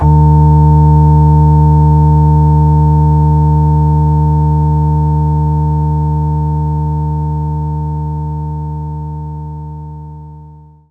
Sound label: Hammond Organ